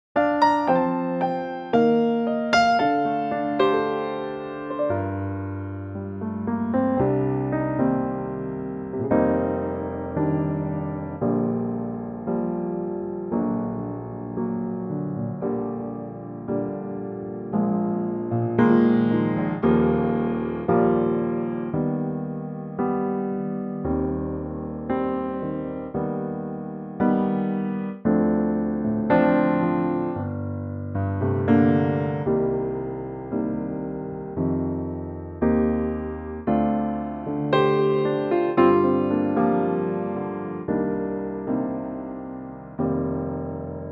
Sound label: piano Bb